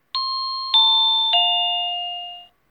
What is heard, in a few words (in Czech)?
melodie